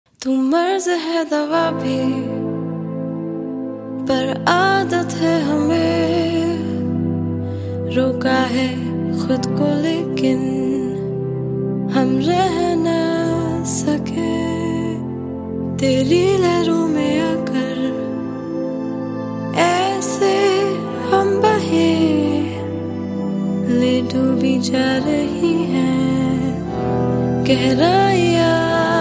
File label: hindi love song tone ringtone; bollywood